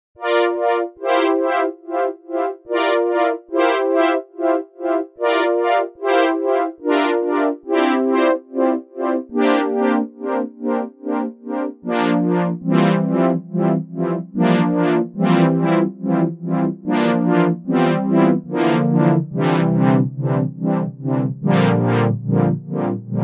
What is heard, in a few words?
lfo brass; obx; vst audio; opxvst